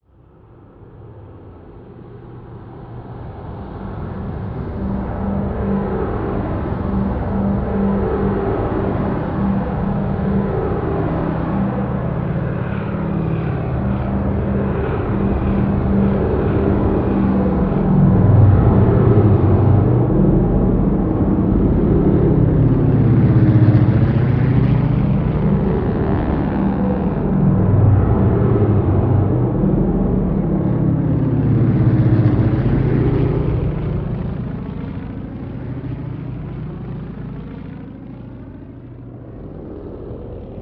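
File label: Ambient Vehicles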